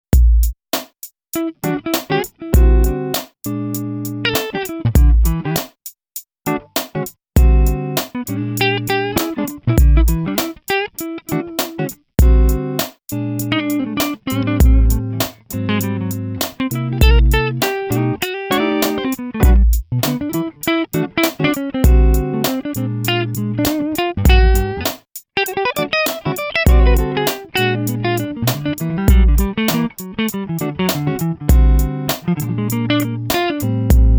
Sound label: Hip Hop Jazz